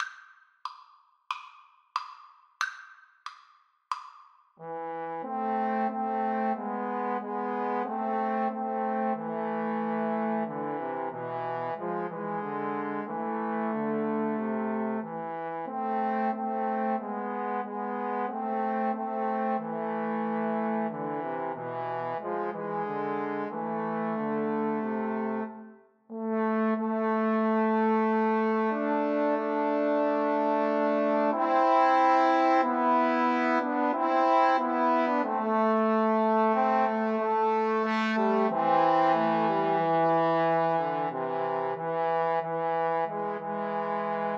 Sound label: go down moses, Trombone Trio